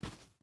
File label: footstep boots sand walk; Rust